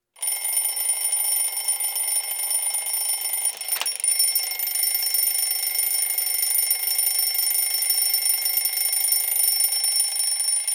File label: Hand Put Down An Alarm Clock